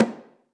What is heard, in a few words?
AFRO.TAMB, AFRO.TAMBORA; AKAI S-Series CD-ROM Sound Library VOL-5